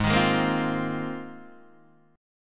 G sharp 6th Add 9th G sharp Guitar DADGAD

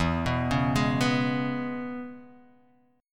E 6th, down, Guitar, Half Step Down

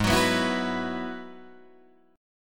Ab Minor 7th Sharp 5th Ab, down, Guitar, DADGAD

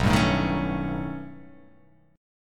B Augmented 9th B, chord

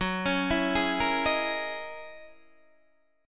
F sharp 13th F sharp down Guitar DADGAD

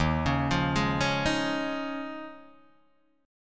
Eb 9th Suspended 4th Eb, Guitar DADGAD; arpeggiated; chord

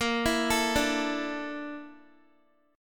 A sharp Major 7th Flat 5th, down, Guitar; arpeggiated

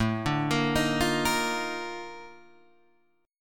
A sharp Major 11th A

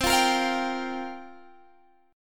C 7th Suspended 4th, Guitar, Open G

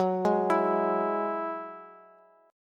Gb 7th Suspended 2nd Gb Bass; arpeggiated; JGuitar

